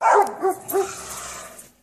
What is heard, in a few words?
Riding Dogs